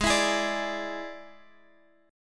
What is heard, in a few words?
D Suspended 2nd Flat 5th Ab, guitar